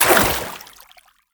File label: water sprint; skyrim